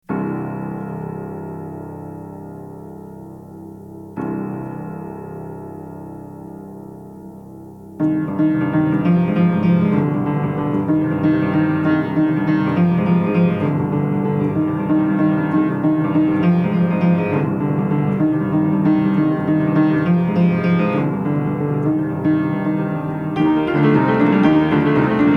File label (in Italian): impromptu allegro maestoso